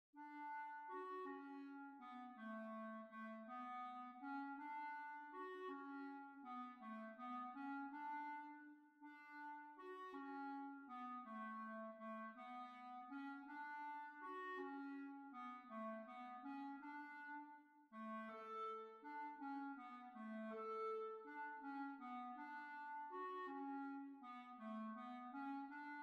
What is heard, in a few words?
Waltz; B flat